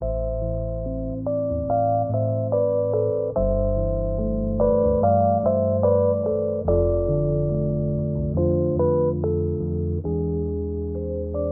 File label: vibes; Sad